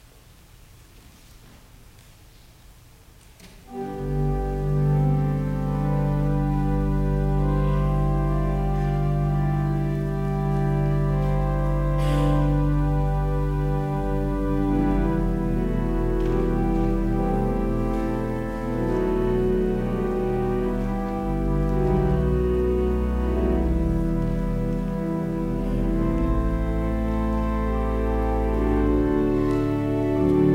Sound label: Organ Music